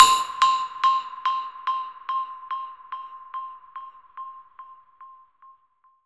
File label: FX Percussive Drop Echo